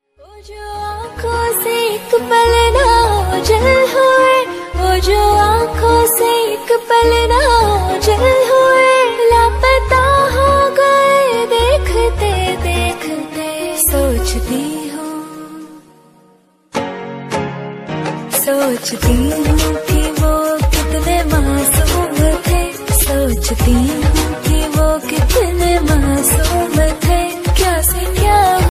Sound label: female version